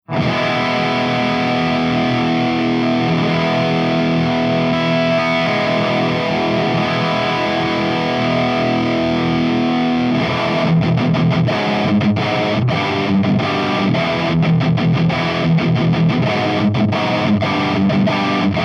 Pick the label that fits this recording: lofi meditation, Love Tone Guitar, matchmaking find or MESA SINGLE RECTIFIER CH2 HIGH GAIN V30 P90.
MESA SINGLE RECTIFIER CH2 HIGH GAIN V30 P90